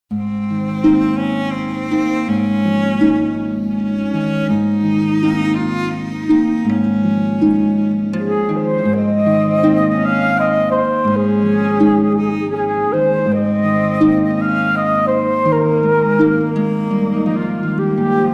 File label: Harp